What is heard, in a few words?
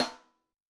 Ana Me Rim, Yamaha DTXpress-II snare